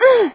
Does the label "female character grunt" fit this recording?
Yes